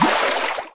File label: water movement; Spearhead Demo